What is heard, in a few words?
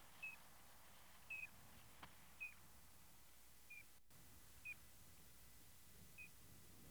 piping plover